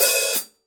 medium hi-hat open, Paiste 14" Signature Medium Hi-Hat